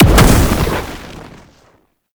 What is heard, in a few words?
Bow Projectile Fire Explosion; Madurai; Focus; Ultimate Abilities